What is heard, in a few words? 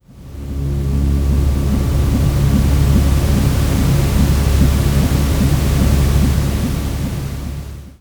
sleep mix